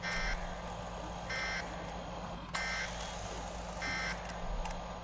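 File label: ambience pc idle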